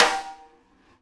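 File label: SIDE STICK, Live Snares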